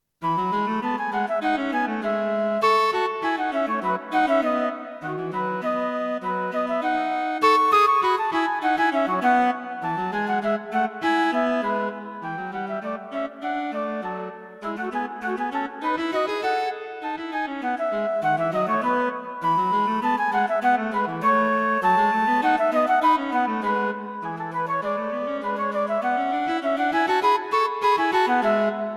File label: Flute and Cello Duets